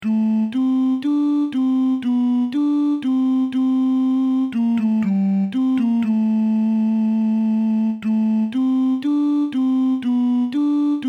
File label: For the Fruits of this Creation Alto